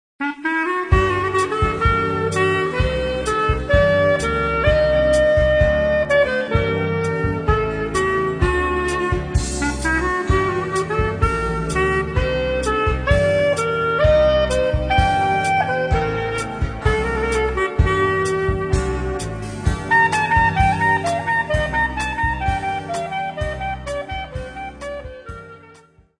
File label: fugue